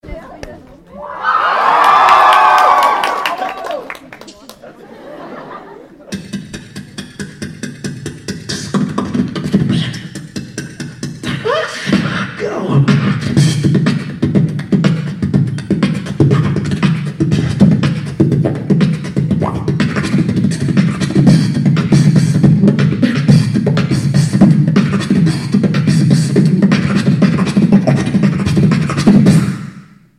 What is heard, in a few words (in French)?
Debut concert boite a rythmes